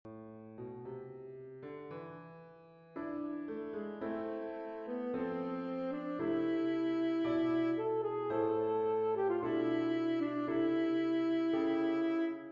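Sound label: Etude Vocalise, saxophone; Faure, Etude-vocalise, beginning; Saxophone Solo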